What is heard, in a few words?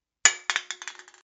can Bounce; GameplaySequenceDemo